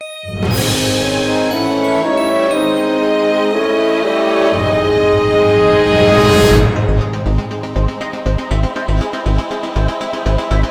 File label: Cinematic Post Boss Fanfare; Shantae Half-Genie Hero